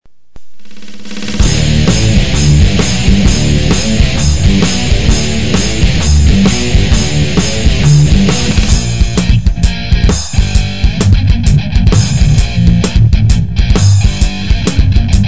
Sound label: bass